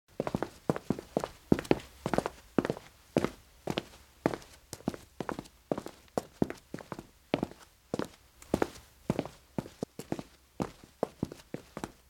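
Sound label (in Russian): небольшая группа шагов идущих вперед по гранитному полу офисного здания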